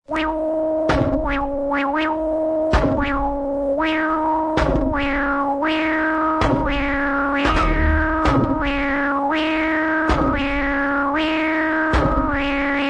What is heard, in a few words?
electro cut